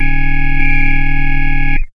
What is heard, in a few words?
firing warning; launch; video game; source engine; portal2